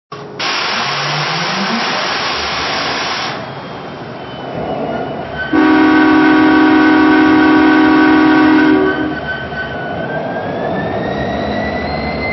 SD70ACe, trains

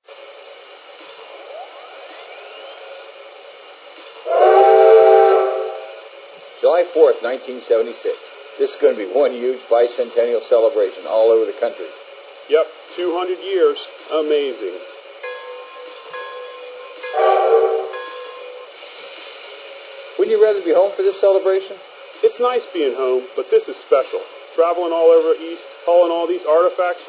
t1, trains

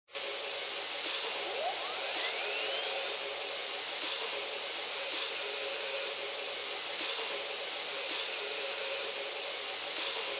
t1; 6110

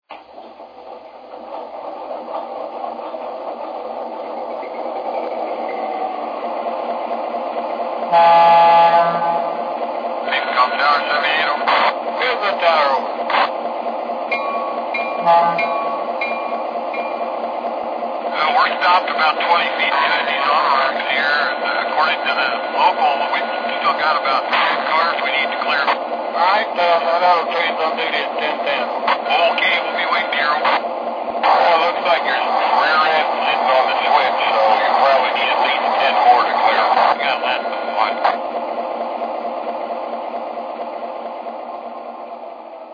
NW2, trains

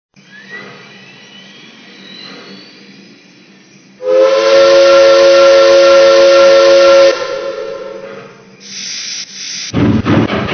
482 USRA

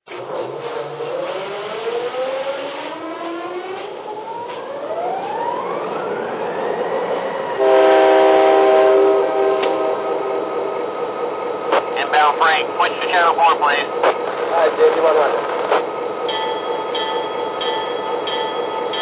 SD40 2, trains

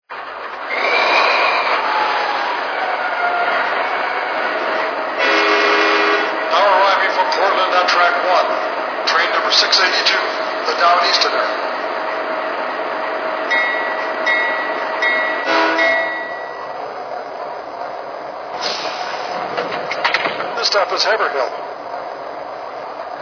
Genesis, trains

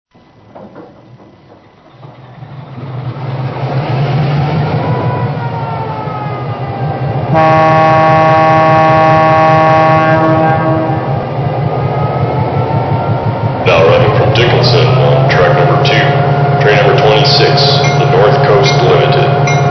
EMD F3

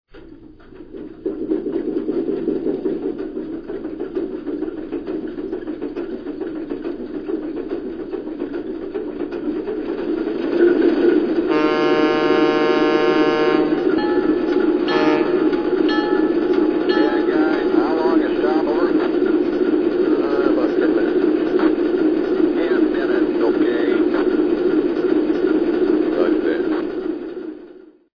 Alco RS3, trains

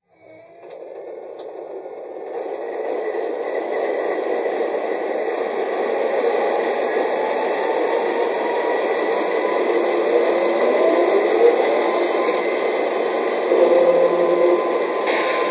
es44

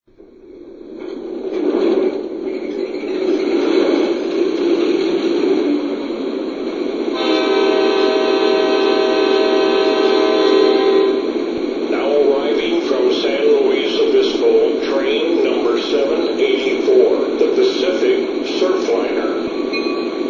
F59PHI, trains

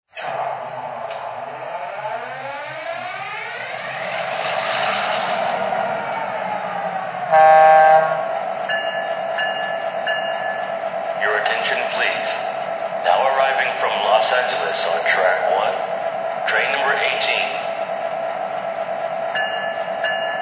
F3, trains

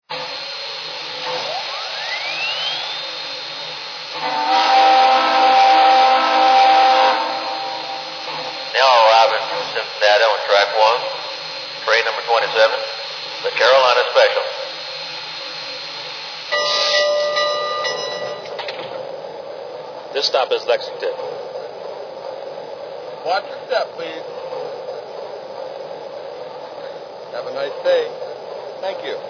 Kanawha, trains; 2775

